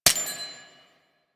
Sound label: Mech, Weapons; Warframe; Gotva Prime